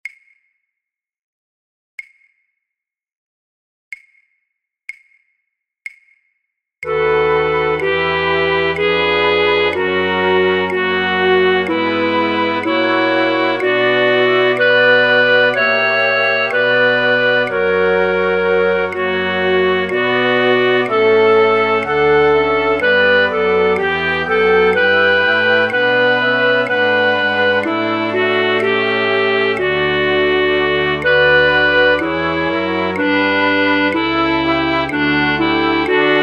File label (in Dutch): fluitorkest, Fluit 3B1, Fluit 3B2; voor oefentracks; Matthaus Passion